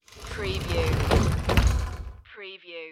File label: shower sliding door